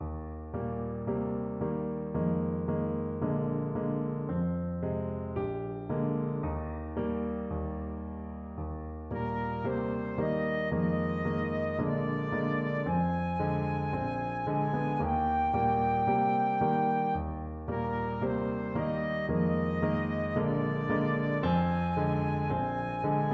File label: flute